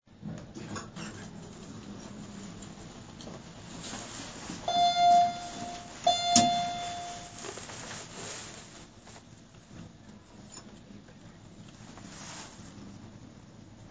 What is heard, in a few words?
Field Recording Elevator